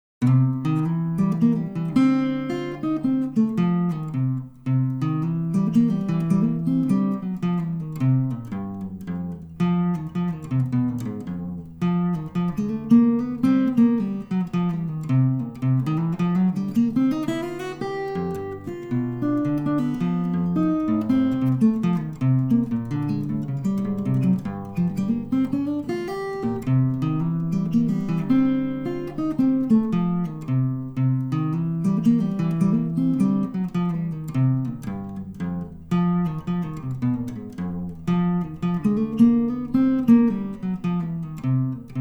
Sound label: open position blues